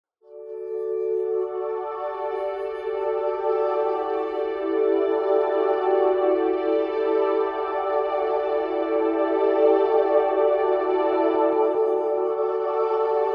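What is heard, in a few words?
Vintage Oil Can Echo, Adding wetness to an already wet sound, tweaks to the Time knob create a feeling of rising and falling.; Dry and Wet mixed in Pro Tools; Crash / Pads; Olivera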